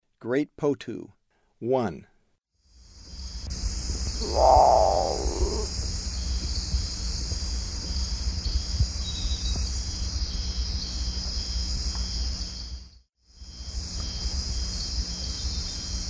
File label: Great Potoo; S. America; Eastern Lowlands